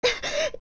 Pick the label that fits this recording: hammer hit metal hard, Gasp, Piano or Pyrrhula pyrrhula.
Gasp